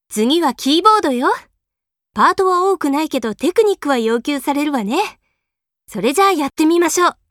Keyboard Introduction; K-ON

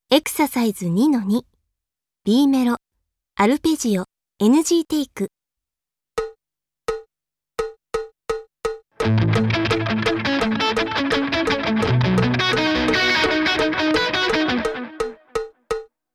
Mellow Arpeggio NG Take (w Delay); K-ON

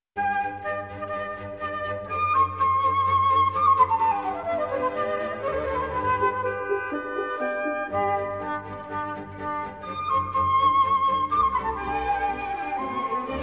Flute Concerto G major; Flute Concerto No. 1 G major first movement (Mozart); Legend of Galactic Heroes; Free Planets Alliance SIDE

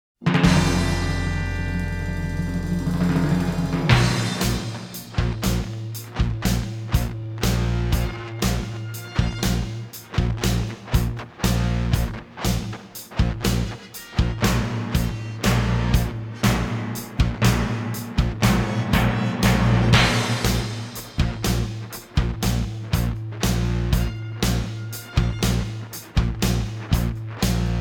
rhythm only; Neon Genesis Evangelion; Evangelion S2 Works

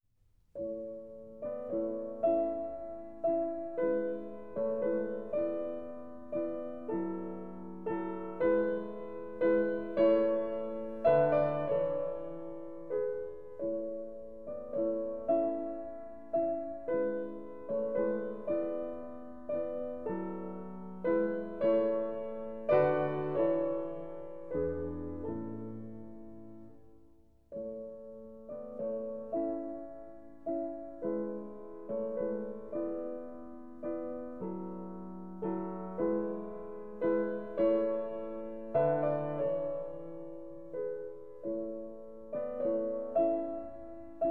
Piano Sonata No. 11; with the Turkish march No. 1 movement (Mozart); Legend of Galactic Heroes; Galactic Empire SIDE

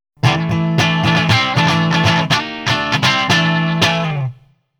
Back Guitar; K-ON

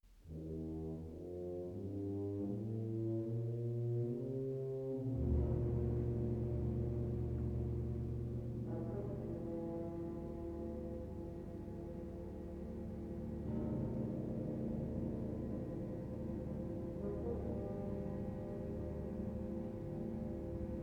Symphony No. 10; F-sharp major fifth movement (Mahler); Legend of Galactic Heroes; Galactic Empire SIDE

